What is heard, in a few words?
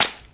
leather bash flesh